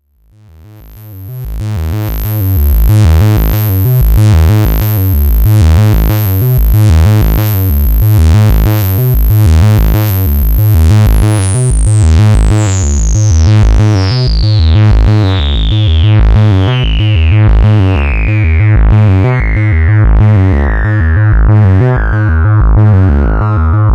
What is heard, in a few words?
2 VCO 24dB VCF high Res